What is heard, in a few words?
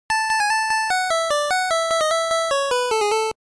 Fanfare Victory; Tetris (Game Boy)